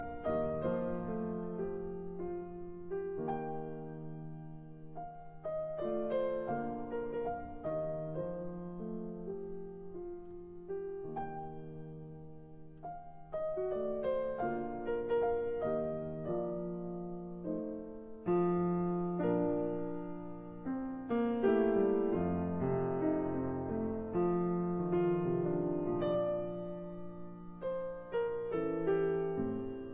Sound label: Piano Sonata in G minor Rondo Presto; Piano Sonata No 2; Op 22